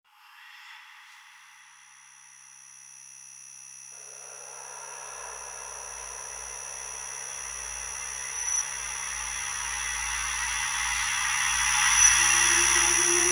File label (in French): Paysage électronique avec train